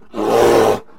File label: bear death; mob